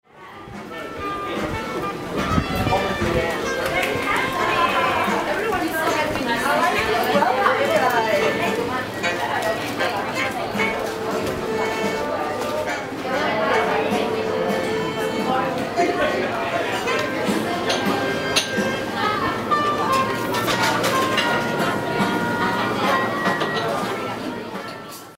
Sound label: cafe plus jazz